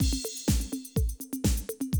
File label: 125, Classic Drum Loops